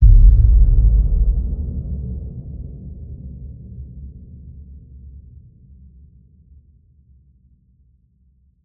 HIT Boom, Cinematic Hits Designed

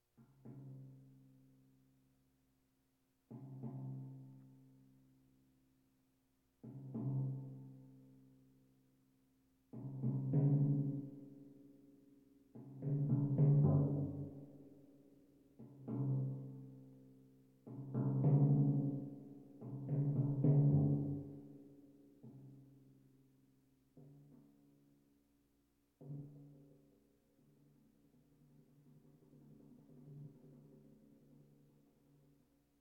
Percussion Bongos Tympani rolls Gongs Drums

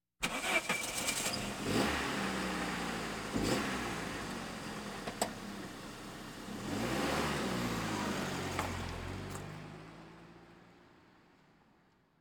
Automobiles various

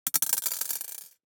DICE on Glass, Throw and Roll, Mini, 3 Three Dice; Samples; ArticulatedSounds; DICE Sfx Party (Extended)

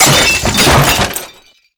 pub attack window brake; DeadFright

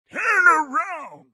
car reaction; Blind Drive

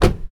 car door close; NS Studios - ultra sound collection; vehicles

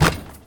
Street Ball Dunk Nylon, Street Basketball; SFX Kit

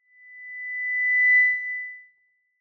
Microphone Feedback - High Tone; Hollywood Edge; sound designer's toolkit v2

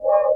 Scifi Menu Tone; Menu FX

